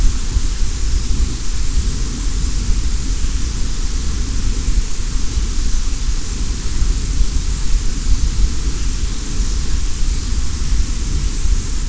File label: wind